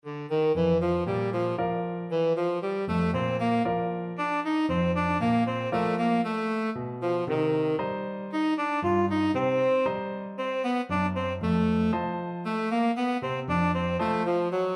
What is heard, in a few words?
tenor saxophone